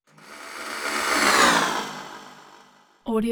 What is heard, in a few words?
engines motors; soundbits soundfx